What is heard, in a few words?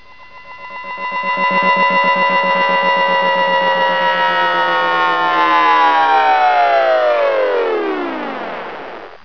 c4 disarm, weapons; furien